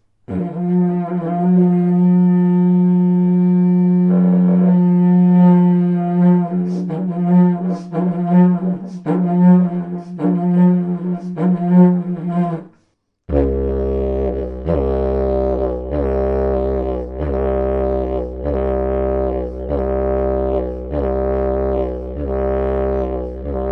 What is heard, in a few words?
Didg; Den här har jag gjort själv i Bolkdjam; didgeridoo